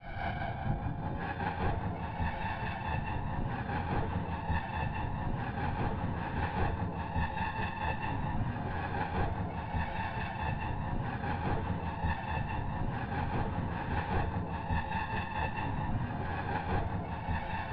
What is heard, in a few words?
Player Hand Slide Energy Loop